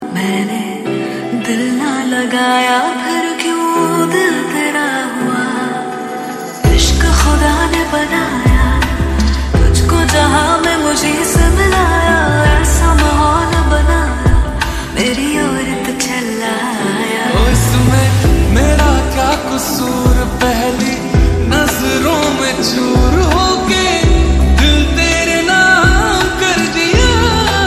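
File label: Female Version Slowed Reverb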